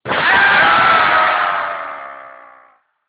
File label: metall fall and screams